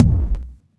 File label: kick; Breaks N Beats Sound Kit